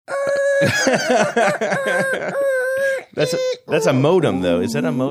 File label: imitating tones used to send fax